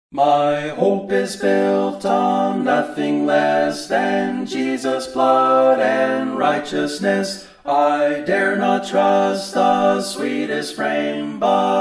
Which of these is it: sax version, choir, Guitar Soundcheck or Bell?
choir